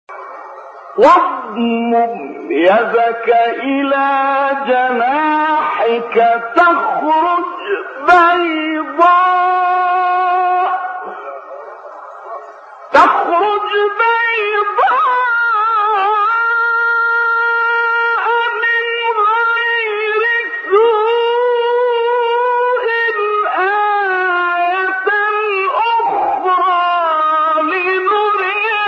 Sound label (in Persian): فراز دلنشین آیه سوره طه; استاد نقشبندی